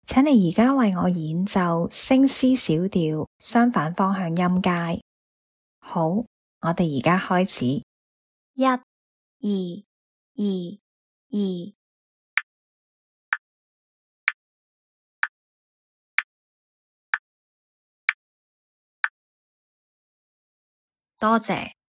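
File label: C sharp minor contmotionscale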